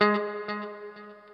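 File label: NOODLE SHORT, Guitar & Bass; Quantum Leap; 90's Sample CDs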